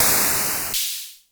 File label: KLONE NOIZ, Klone Dual-Percussion-Synthesiser, HHAT; Drum Machines